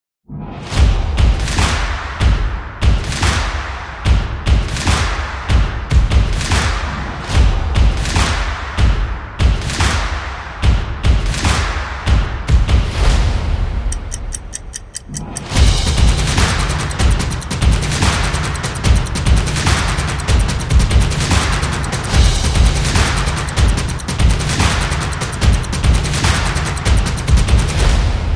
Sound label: Suspense Fight; war drums